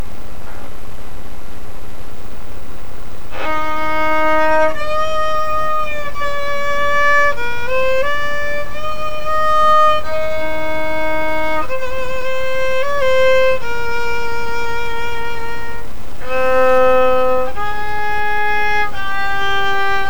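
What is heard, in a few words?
new sound post bridge, violin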